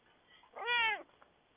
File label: cat meow